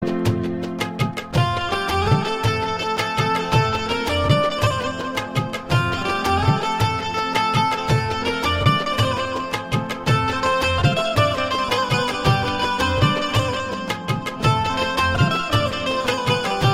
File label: Instrumental; Raazi